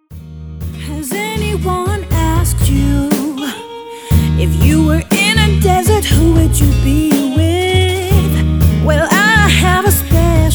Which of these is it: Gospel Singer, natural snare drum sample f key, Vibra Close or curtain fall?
Gospel Singer